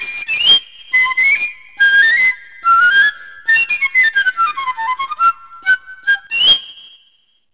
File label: Piccolo